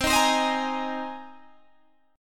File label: C Minor 7th Sharp 5th C down Guitar Open G